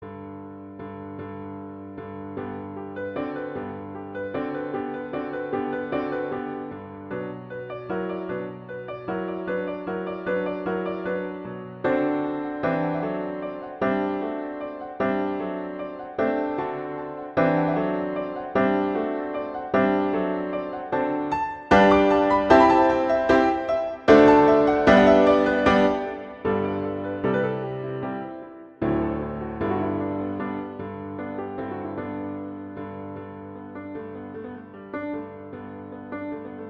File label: Jumping Dance; Grieg; Lyric Pieces